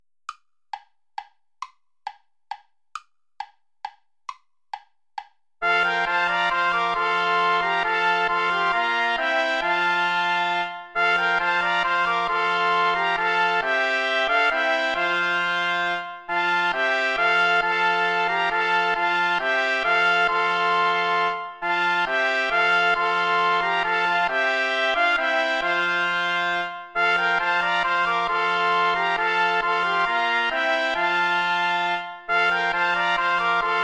volta play along without trumpet; praetorius michael

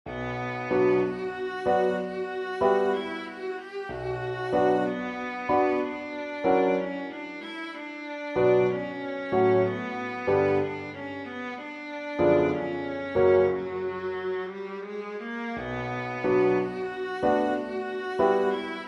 romance sans paroles for viola piano; saint saens camille

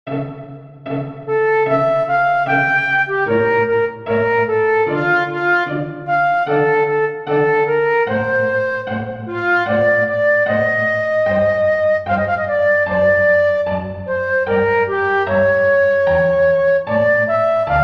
recitative for flute strings; bach johann sebastian mein jesus schweigt falschen gen stille

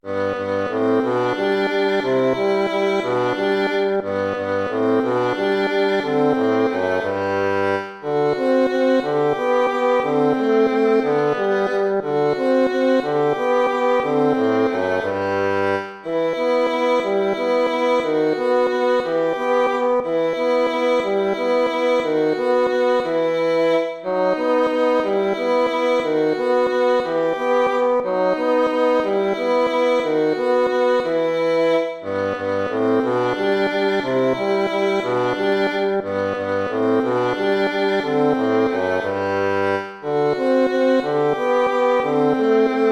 waltz; Carulli Ferdinando

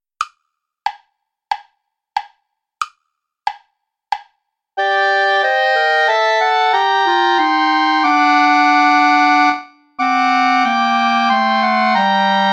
play along without clarinet

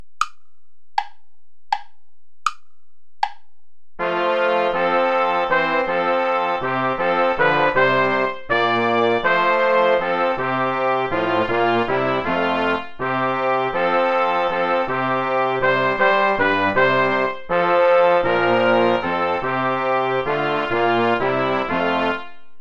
play along without trumpet

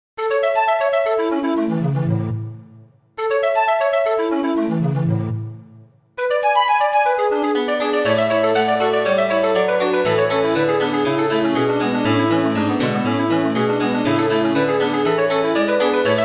prelude; johann sebastian bach bwv 536

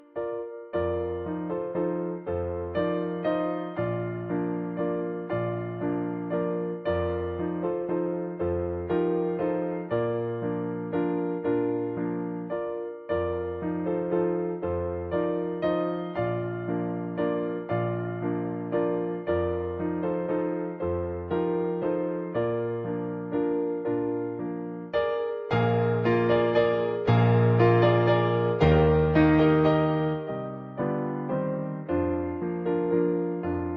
german dance; schubert franz peter

